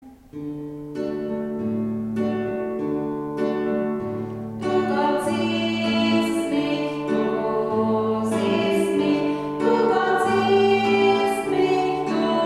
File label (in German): zweistimmig; Jahreslosung 2023